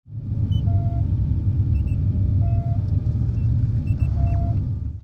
vehicle level; Cosmic Rage

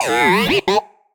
happy, baby

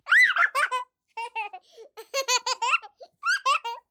y laugh, birthday; CosmicRage; CosmicRageSounds

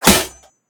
metal, combat, weapons, swingable; default swingable

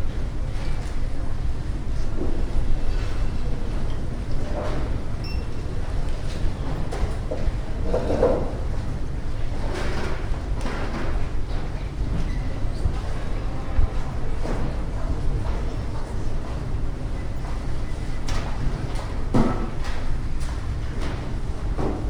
library; CosmicRage; ambiances

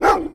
bark

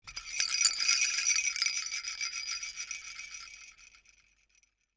rattle, toys

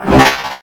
att, enemy droid; CosmicRage; combat

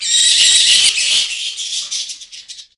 sonar; Cosmic Rage

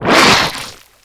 vacus hit; general combat enemy

